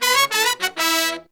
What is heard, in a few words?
96BPM, Horns; Phantom Horns; 90 s Sample CDs; Zero-G